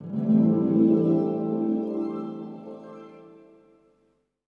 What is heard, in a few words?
D+B 160; eJay